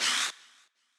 paper

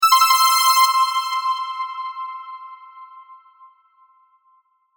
correct; 正解９１（おしゃれな正解音の高音ver）

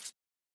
Rip Up Paper

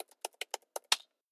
Keyboard

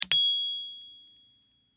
correct; 正解の音・ピンポーン

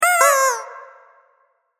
correct; チキンのおもちゃでピンポン。その２; 正解の音・ピンポーン

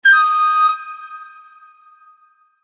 correct; 和風のピンポンの高音ver; 正解の音・ピンポーン

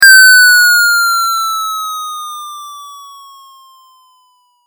game effect

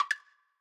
Woodblock

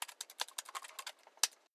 Keyboard

